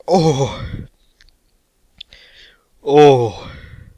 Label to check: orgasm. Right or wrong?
Right